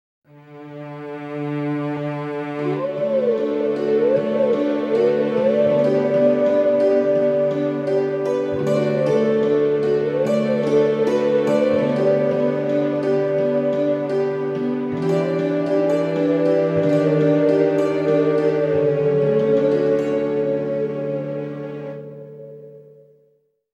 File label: Howling Duet; Legend of Zelda Series; Twilight Princess (GC)